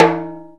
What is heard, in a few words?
Timbale Rimshot, drum machines